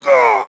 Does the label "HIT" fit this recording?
Yes